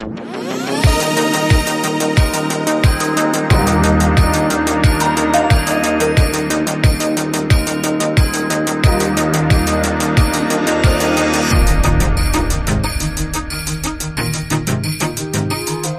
Rock the Xmas Bells Rhythm

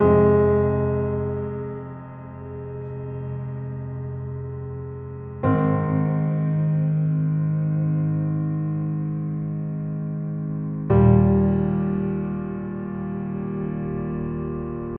Mellow Piano Underscore

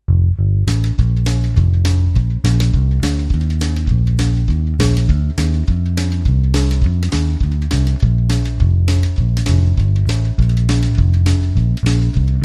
Down Home Bass

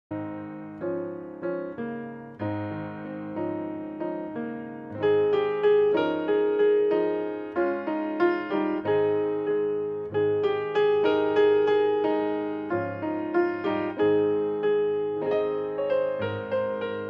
Good King Wenceslas, Solo Piano

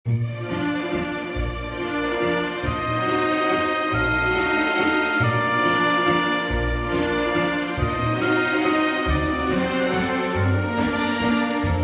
Celebrating Christmas Enchantment

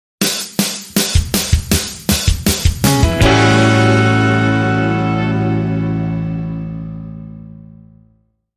Motown One Chord

